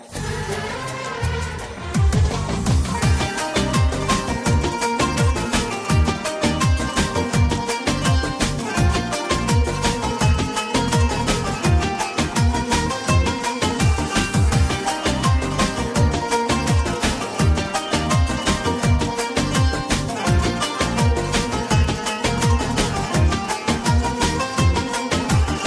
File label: Beautiful Uzbek Tone